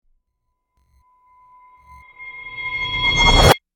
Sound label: Revers